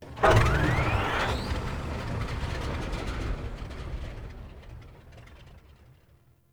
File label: Crew Ship Pilot Enter; Warframe; RailJack; Grineer Crew Ship